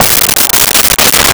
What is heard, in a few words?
Hockey Puck Board Hit; Sports & Recreation; SONY PICTURES SOUND EFFECTS SERIES